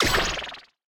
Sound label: Explosion; magic maid cafe